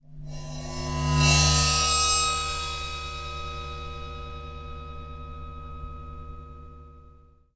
Bowed Hihat

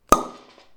Cork Bottle Opening; Bordeaux Chateau Le Luc Regula 2003

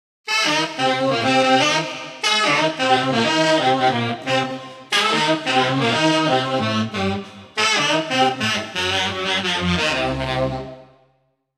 Too Damn Funky Multisax Variant

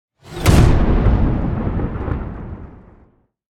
Powerful Thunderous Speed Boost

